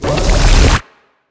poison warn

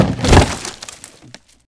wood plank breaks

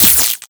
red lightning weak

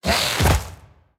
war knockdown

